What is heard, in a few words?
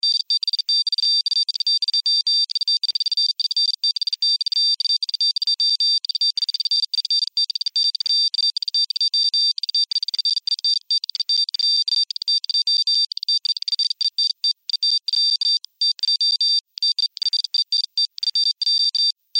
Sci Fi Radio Communicator Code Beeps Signal; Communication